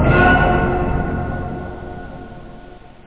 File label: accented, stabs; da capo vol1; instruments